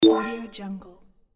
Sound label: futuristic glass point ding